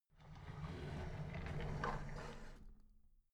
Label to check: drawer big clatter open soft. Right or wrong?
Right